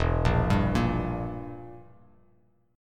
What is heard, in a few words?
E Diminished E, Bass Standard; Edim arpeggiated; JGuitar